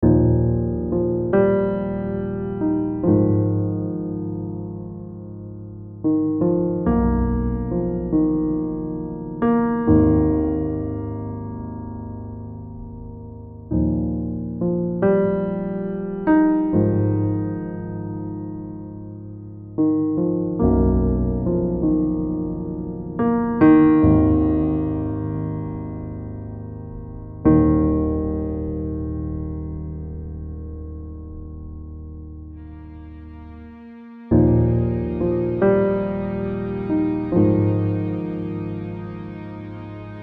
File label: bhoutan piano